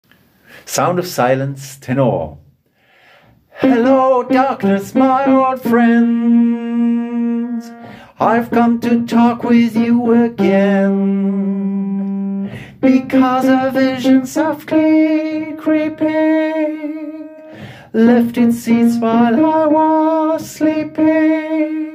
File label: Tenor